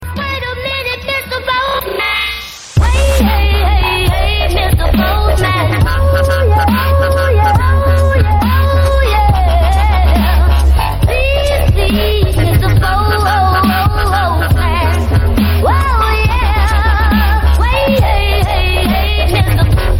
dubstep; ringtones; postman

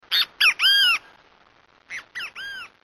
Great Kiskadee

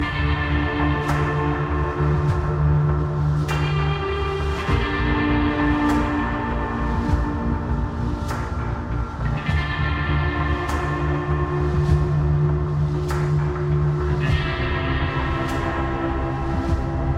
goggle; splinter cell

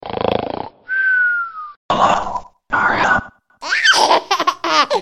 child play

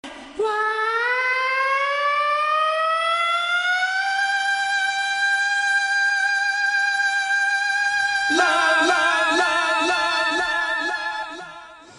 Vocal

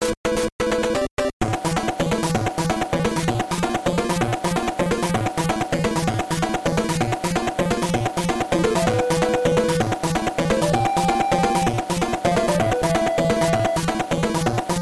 Bowser Battle; SMB3

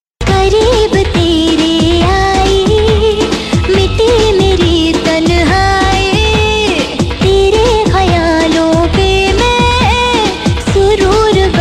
lovely tamil song, ringtone

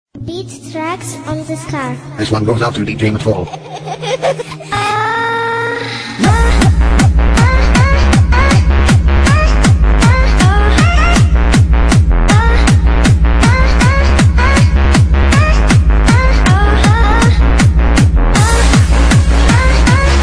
techno orgasm song

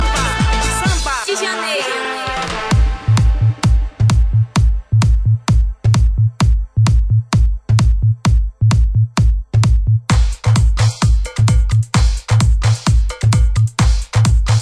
samba

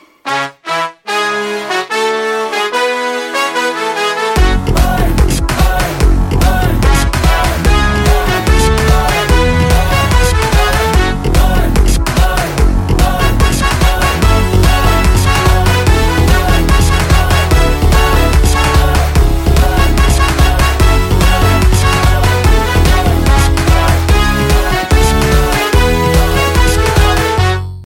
instrumental

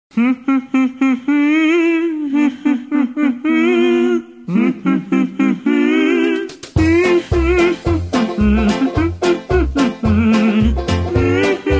Mmmm

